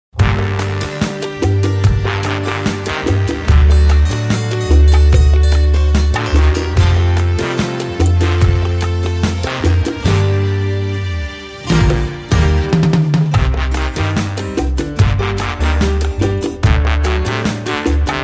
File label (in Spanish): pista, pistas musica ritmica